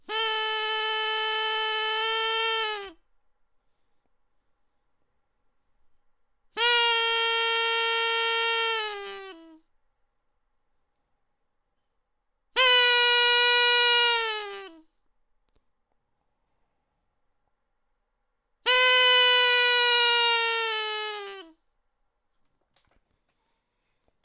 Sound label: noisemaker; noisemakers; NS Studios - ultra sound collection